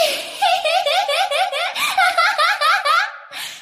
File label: ihihihihi hahahaha yandere laugh